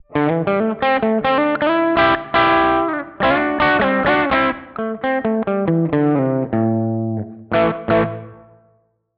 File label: Marshall Blues Breaker